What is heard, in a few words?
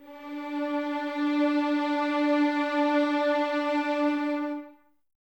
D 4, 11 Violins, Soft; Miroslav Vitous